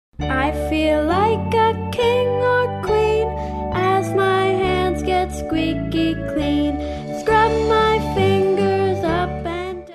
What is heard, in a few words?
Squeaky Clean child's song